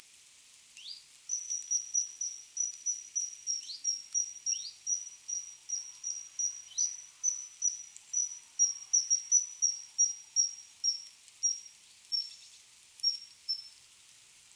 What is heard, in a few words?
Common Kingfisher Alcedo atthis